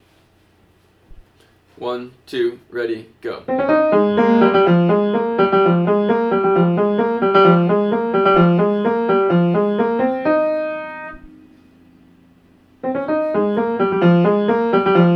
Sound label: tenor melody